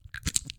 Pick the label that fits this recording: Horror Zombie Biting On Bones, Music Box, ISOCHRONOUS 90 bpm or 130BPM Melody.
Horror Zombie Biting On Bones